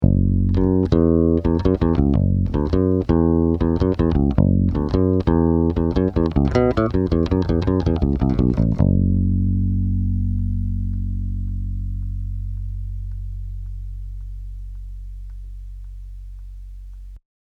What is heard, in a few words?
Bass Flat Treble 10